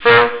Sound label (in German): HUPE